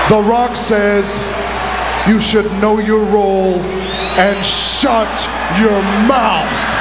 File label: ga pot